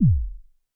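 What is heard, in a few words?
bass drum Rave, Kick, Yamaha DTXpress-II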